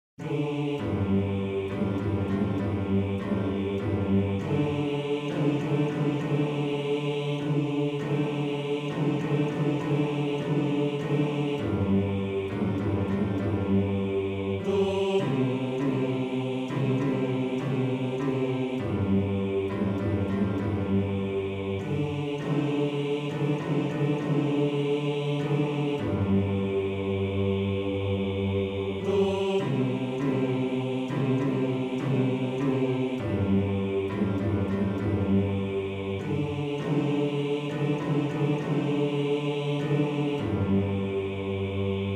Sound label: Bass 2, maennerchor; Bajazzo